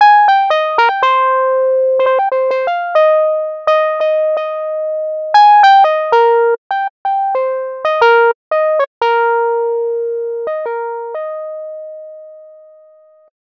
Converted Synth Melody

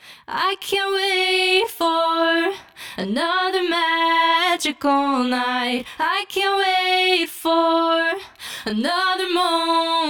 Harmony

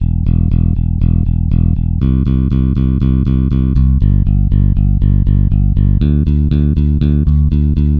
EQ Bass

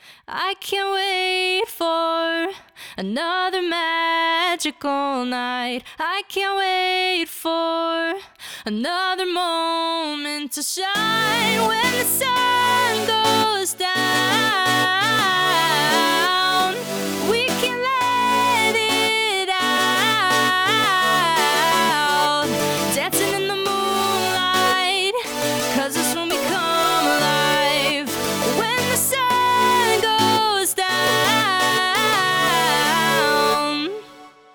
VOCAL SYNTH ASSIST ONLY

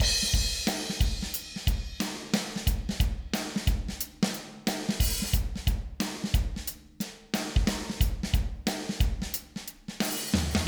Drums Limiter Hard

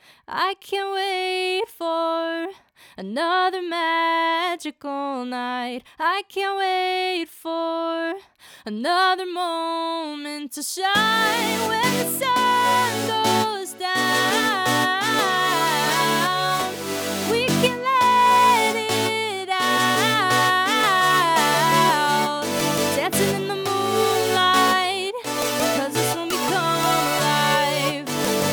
VOCAL SYNTH

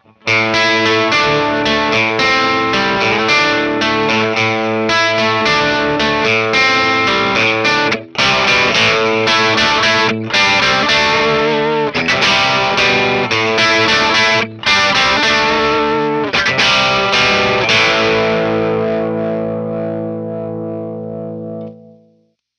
JCM 800

